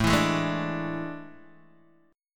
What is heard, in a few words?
A Major 7th Suspended 4th, Guitar, DADGAD